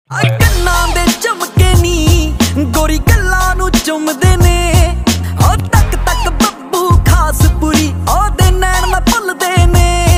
Punjabi Ringtone

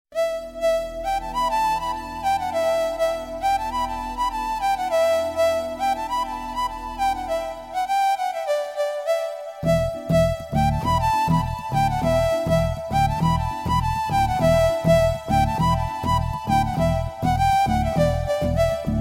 Flute BGM, Telugu; Athadu

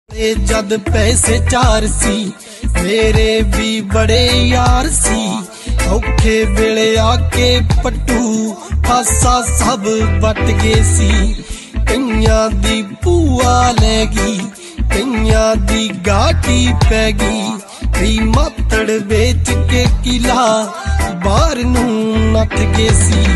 Punjabi Tune Ringtone

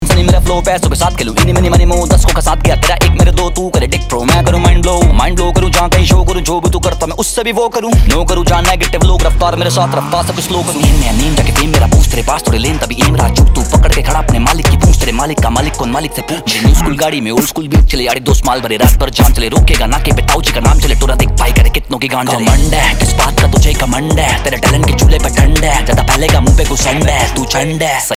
Rap Ringtone

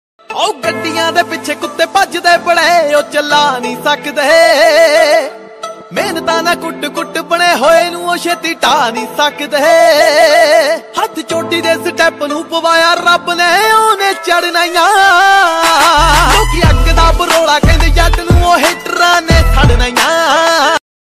Popular Punjabi Ringtone

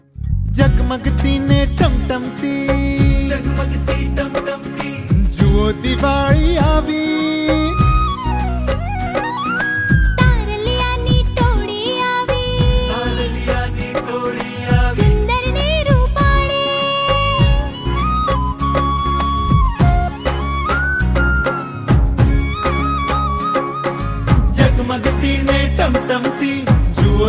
Gujarati Ringtone

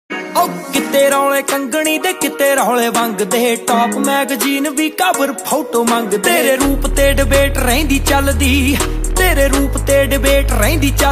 Dance Punjabi Ringtone

